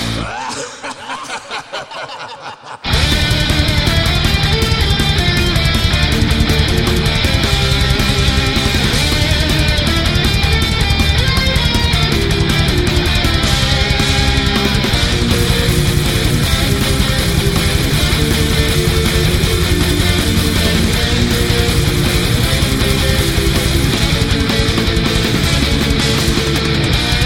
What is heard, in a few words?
Epic Sonic Metal